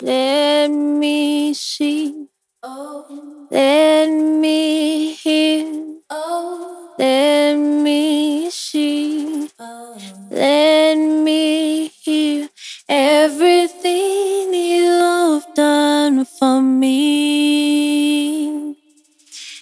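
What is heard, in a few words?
140, G minor, Pop RnB, Acapella; Female; Singing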